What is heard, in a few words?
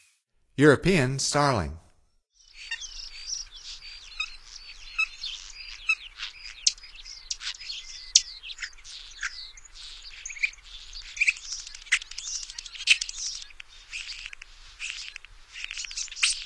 European Starling, Songs of Birds of North America; Stokes